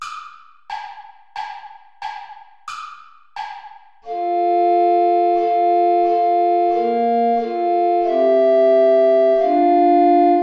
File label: dans une table obscure play along without soprano recorder; traditional